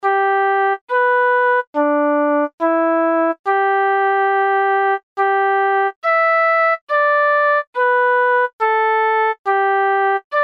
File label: Polka